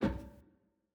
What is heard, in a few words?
Footstep Metal Stairs